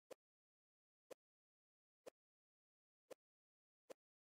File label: Swoosh